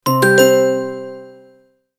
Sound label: Android notification default sound